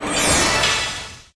random symbol transform; fairyred

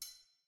slider Decrease Down; lost relics

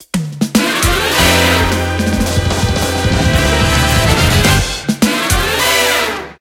cash stack intro sequence music; vegas night life

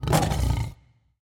lion fx high; serengeti kings

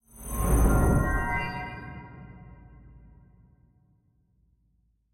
large Win; goldengrimoire

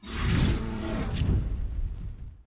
bear; druids dream

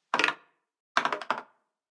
ball Bounce, roulette

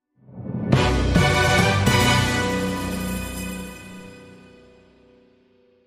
free Spin Splash Screen Intro; steamtower

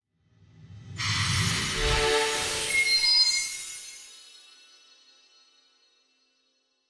freespin Intro IN; codex of fortune

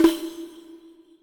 spin Button; wild bazaar

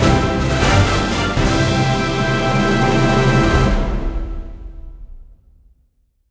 bigWinEnd; excalibur

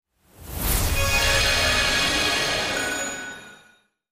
random Symbol Transform; fairyred

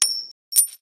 wild coin land; irish pot luck

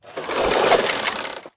sign spin; irish pot luck

